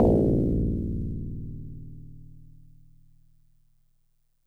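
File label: Tubular Bells DX100 D sharp 1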